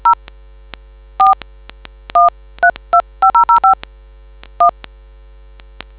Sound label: dtmf